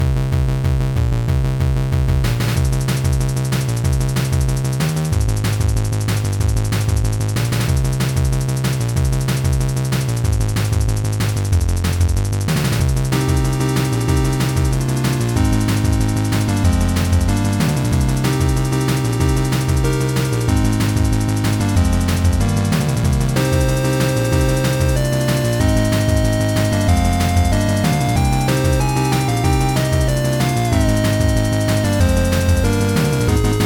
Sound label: 8-bit Battle Song